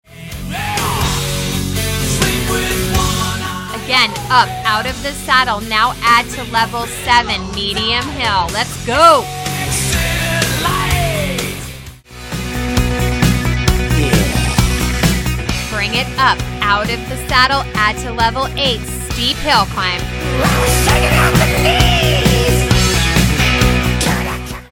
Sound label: Hard Rock; ICOD indoor cycling class